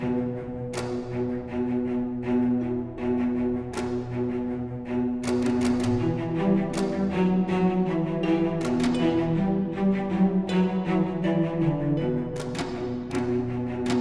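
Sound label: Music Layer Exploration layer; HDF Ch3 Explorartion1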